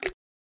keno result; cryptos; casino

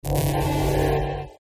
ring loss; casino

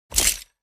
scroll; casino; corgipharaoh

